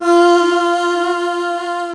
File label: VOX; Zero G; Man Machine